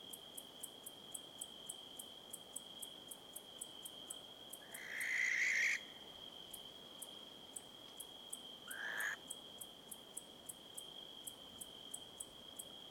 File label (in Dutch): Kerkuil Tyto alba